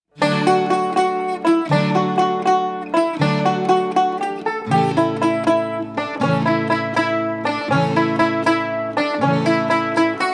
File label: jig